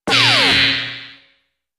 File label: Quick Medium high, zaps; Quick Medium-high Pitched Laser Zap with Medium-low Pitched, Flanged Ring Off ( I.e. Laser Bounce Off Force Field Or Laser Ricco ).